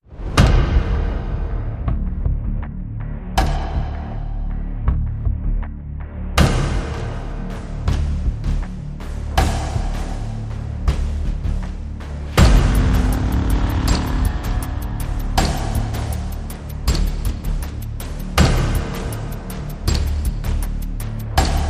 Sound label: Rhythm 2 No Clock